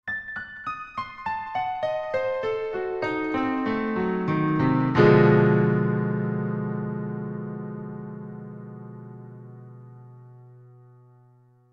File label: Piano Diminished, Piano Dim Descend Chord Ominous; Piano Sound Effects; Blastwave